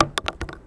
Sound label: wood; Mafie 1